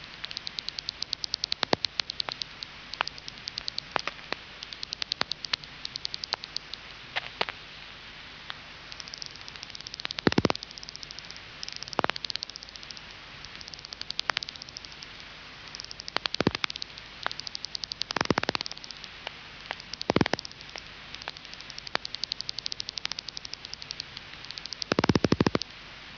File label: bechstein, bats